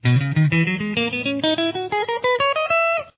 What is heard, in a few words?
B major 1st position, guitar